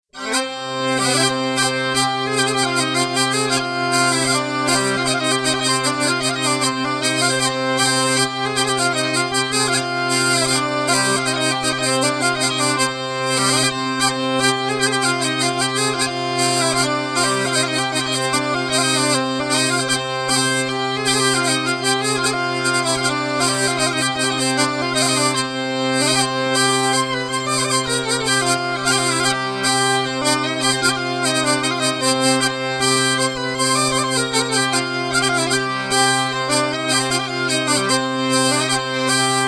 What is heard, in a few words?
Phoenix piezo